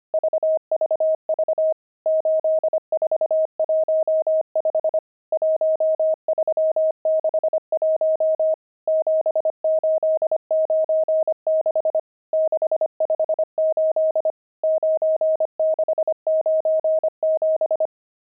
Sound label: pangram, intermediate pangrams files